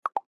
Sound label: bip bop